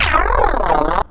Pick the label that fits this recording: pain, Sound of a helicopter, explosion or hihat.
pain